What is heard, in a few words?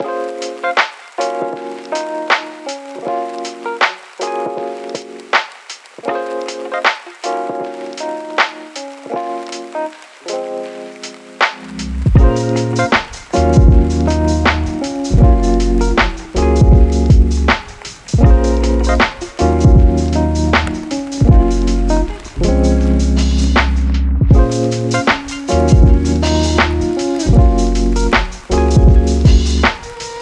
lofi hip hop